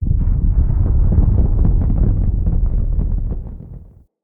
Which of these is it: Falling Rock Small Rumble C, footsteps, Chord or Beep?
Falling Rock Small Rumble C